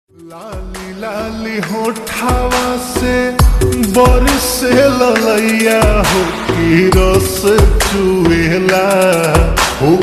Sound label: Slowed Reverb Ringtone